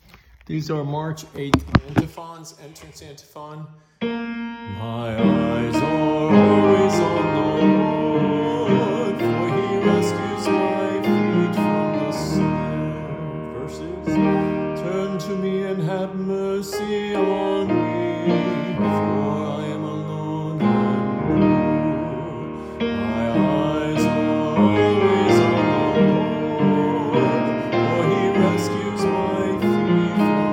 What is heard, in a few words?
Antiphons